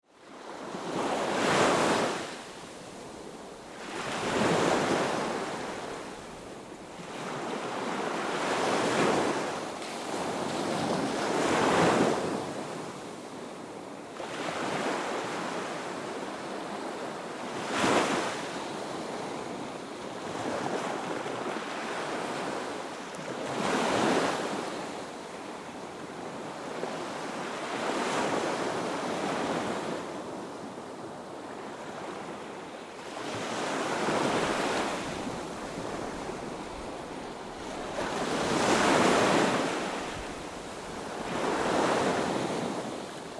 Water Ocean Surf, Heavy, Waves, Close, Perspective, Ambience; Water Sound Effects; BlastWave